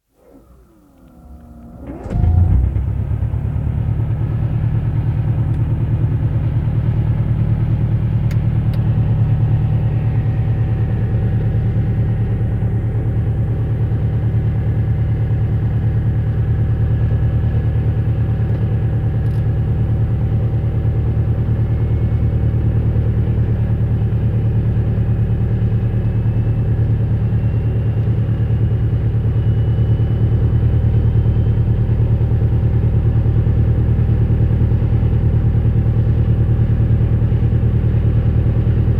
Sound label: Beagle Airdale, interior recordings, Start, tickover, take off; Historical; BBC